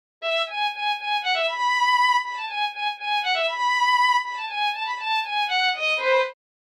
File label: Violin; StickerClone